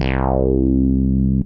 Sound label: BAS, Eurotech; Sounds Good